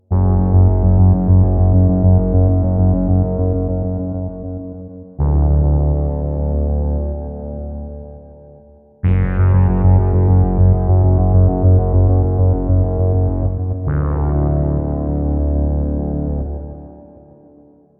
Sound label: Bass Synth; Boss Katana Go